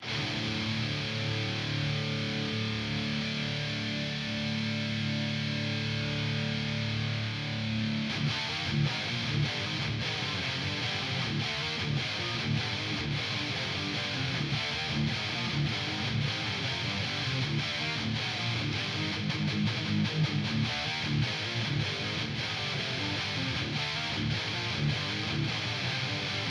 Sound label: GUIT; krankenstein